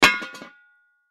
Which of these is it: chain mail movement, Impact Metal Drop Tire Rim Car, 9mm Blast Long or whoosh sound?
Impact Metal Drop Tire Rim Car